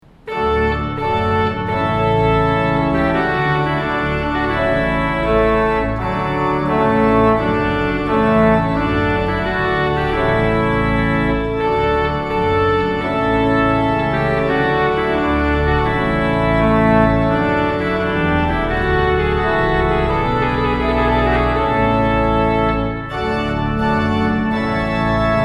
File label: Trumpet Tune; Purcell